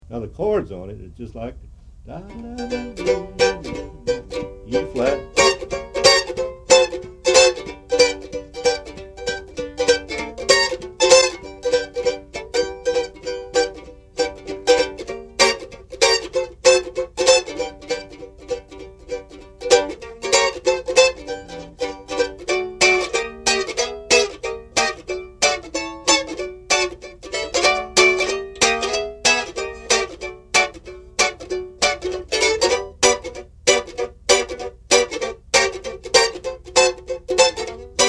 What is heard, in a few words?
Chords; Flop Eared Mule